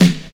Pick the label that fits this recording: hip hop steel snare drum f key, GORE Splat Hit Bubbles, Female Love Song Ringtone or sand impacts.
hip hop steel snare drum f key